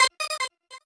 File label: normal hit normal